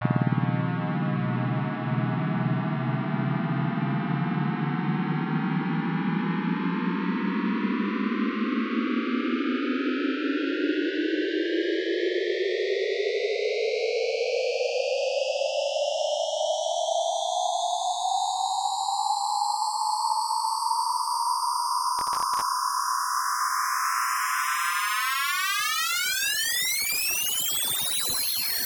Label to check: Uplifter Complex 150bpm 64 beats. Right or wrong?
Right